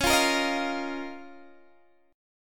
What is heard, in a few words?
C sharp 6th Add 9th C sharp down Guitar DADGAD